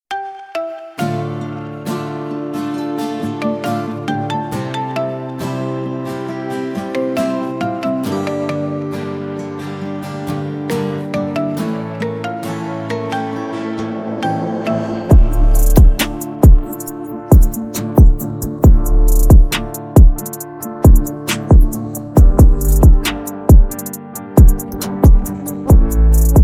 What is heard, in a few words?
instrumental